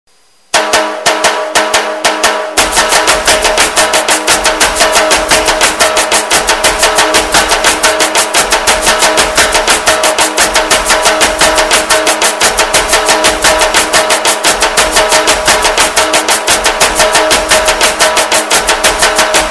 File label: Dhol; Dhamaal